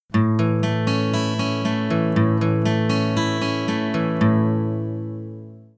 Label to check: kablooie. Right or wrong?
Wrong